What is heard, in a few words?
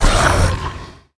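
Dragonneut damage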